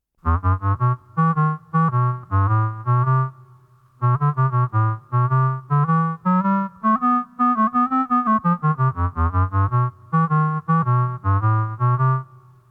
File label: bass clarinet cue